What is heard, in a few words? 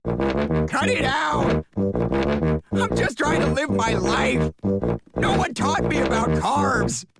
stewie tuba